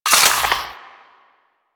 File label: Mech, Weapons Cannon; Kuva Zarr